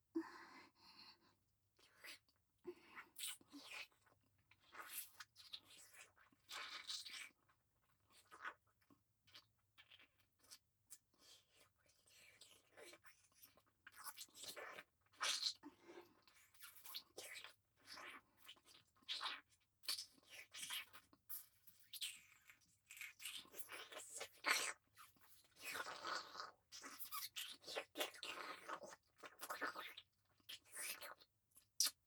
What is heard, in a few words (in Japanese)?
フェラ; リネ